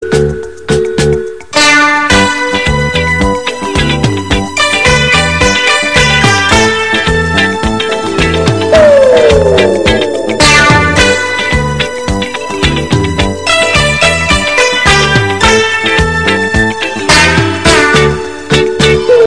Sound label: hindi music ringtone, bollywood ringtones